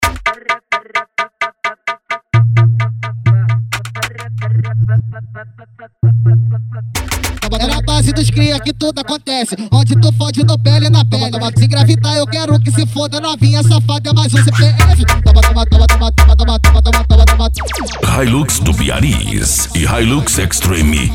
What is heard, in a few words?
funk mega bass